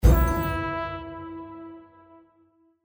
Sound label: horn; Champions of Valhalla